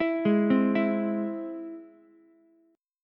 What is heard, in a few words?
Ab Augmented Ab Ukulele; Ab+ arpeggiated; JGuitar